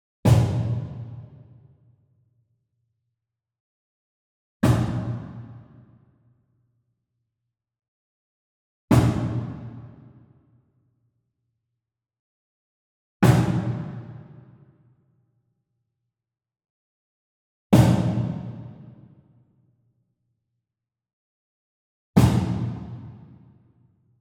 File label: Wood Percussion Cajon High Hit Slap Far; boom library; Cinematic Strikes Construction Kit